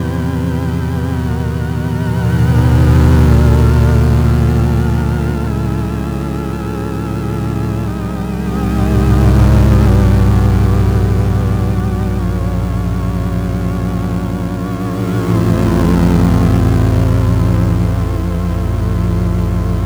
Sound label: synth loop